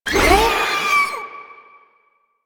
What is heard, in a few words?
Proto Infested Maggot Attack, Enemies, Vocals